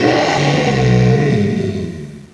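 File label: orc death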